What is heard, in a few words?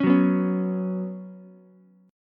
B Suspended 2nd B down Ukulele; strummed; JGuitar